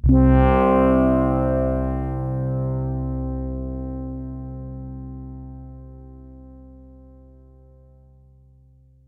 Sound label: SYNTH Brassey Corn Pad Interval Higher